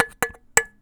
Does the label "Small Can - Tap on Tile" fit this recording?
Yes